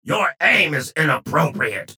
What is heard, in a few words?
pain ability damage; strogg; low health; strogginfiltrator